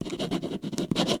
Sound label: Write on Paper with Pen, Office Supplies; 1001 Sound Effects